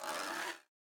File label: Giant robot Creak; Super Mombo Quest